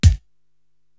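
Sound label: BD, bass drum, drum machine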